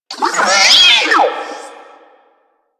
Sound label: Necramech Repair Drone Idle Surprised; Warframe